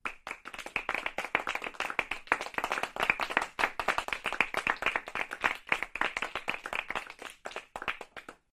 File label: small group polite applause sound effect